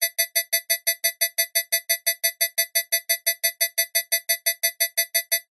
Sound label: science fiction alarm fast high pitched warning tone emergency